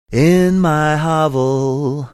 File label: singing